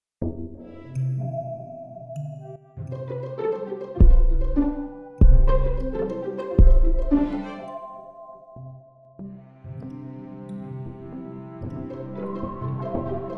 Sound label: pizz dance